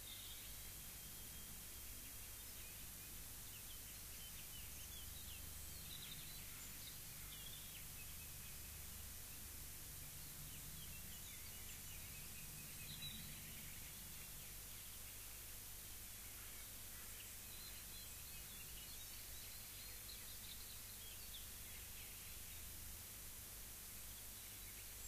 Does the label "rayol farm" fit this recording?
Yes